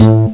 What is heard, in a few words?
slap bass; Atari Mega Archive